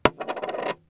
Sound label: COIN